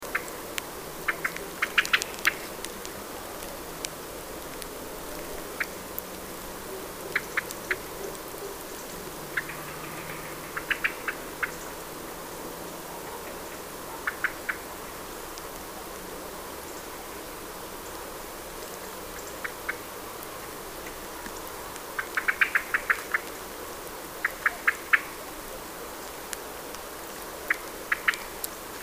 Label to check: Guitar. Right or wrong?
Wrong